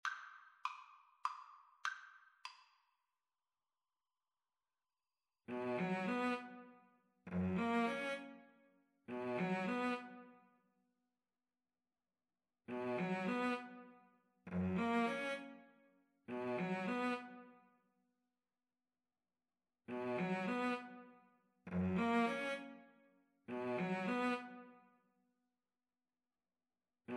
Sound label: fur elise, Violin Cello Duet; beethoven